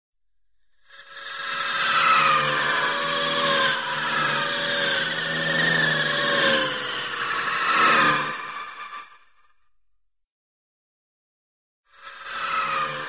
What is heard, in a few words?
sci fi space traffic; cartoon; jetsons